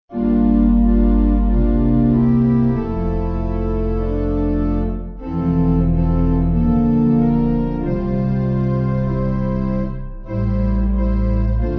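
Pipe, Pipe Organ, Music Backing Files for Churches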